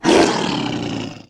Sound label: monster